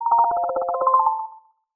htc mobile phlox ringtone

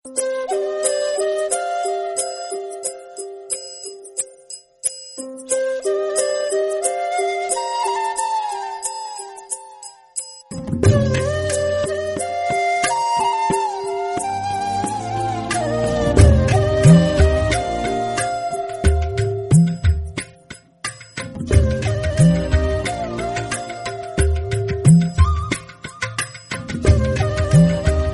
best tamil flute ringtone; bollywood

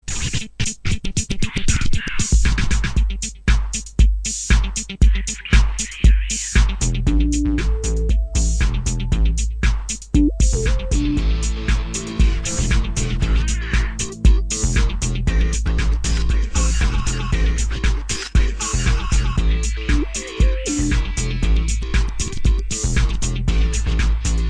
nokia ringtone; nokia 5610 xpress

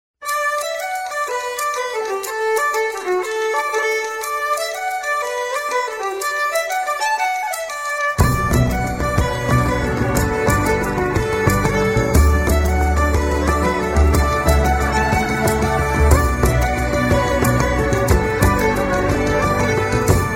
irish traditional folk music tone ringtone

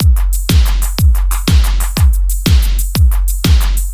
rock looping music contact tone; iphone

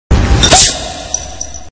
bottle sms new ringtone

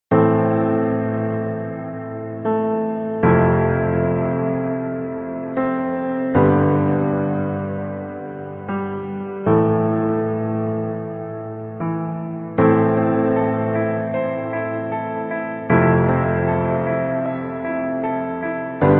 sad piano ringtone

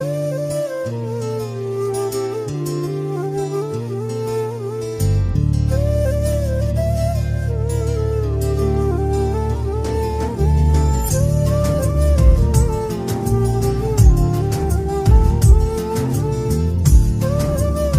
pakistani movie song ringtones

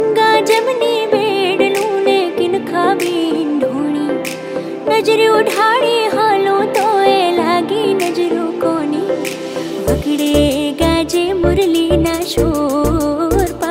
electro version gujarati ringtone; santvani

